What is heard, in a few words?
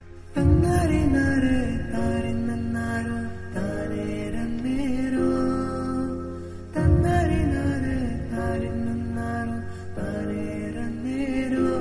MOMS LOVE VOCAL; KGF